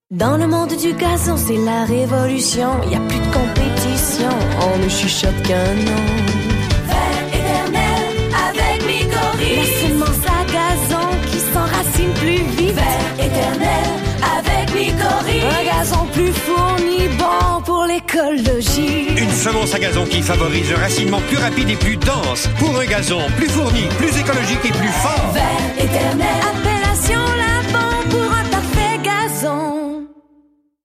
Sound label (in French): chant Jingle